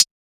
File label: hat, drum one shots; Drum Sample Pack; Hats; titan; Slate; SEISMIC